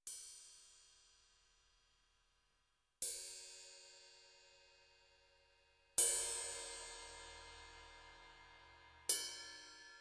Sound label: Ride Cymbal 20 - Plastic Rutes; acudrums